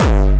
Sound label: BD Distortion, Bassdrums; Vengeance Samples